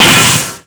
Damage Base; BOMBERGIRL RAINBOW; Arcade

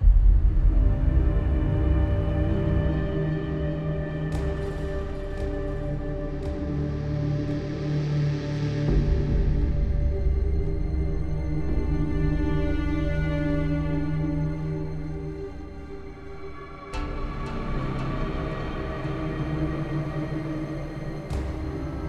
Mood Battle Field

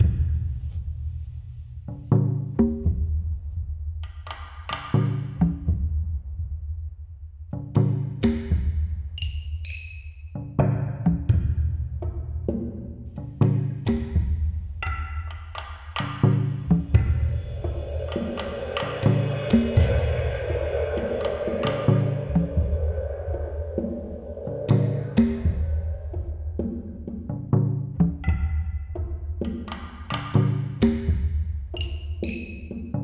drums; Hitman GO Definitive Edition; PS Vita

